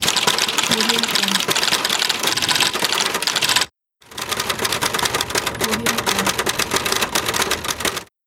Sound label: typewriter